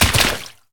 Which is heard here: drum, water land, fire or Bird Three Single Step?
water land